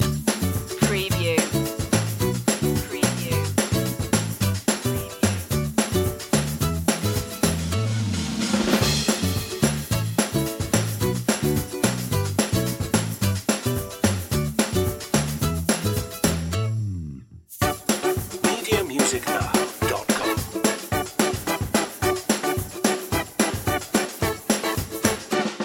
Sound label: loop